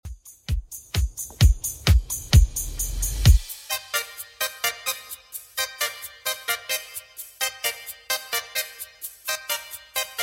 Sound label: 80s Redrum 130bpm